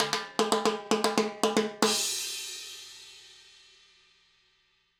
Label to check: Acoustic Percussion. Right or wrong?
Right